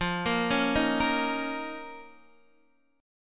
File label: F 7th Suspended 4th Sharp 5th F down Guitar DADGAD